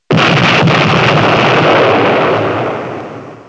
EXP LRG; HG2DEMO

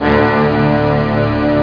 mega string bass; spielberg

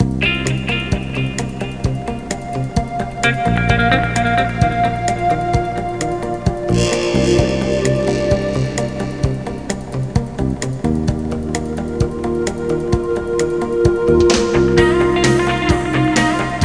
America Drums

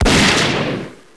shotgun

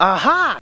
Ahhah!

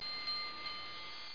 bell; Image Bonanza; Expert Software

